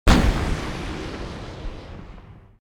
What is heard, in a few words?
explosion